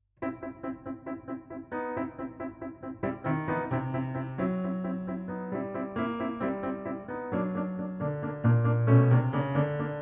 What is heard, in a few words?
piano